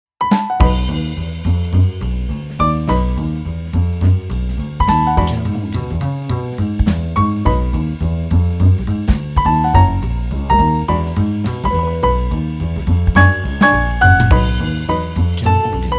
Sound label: Jazz Piano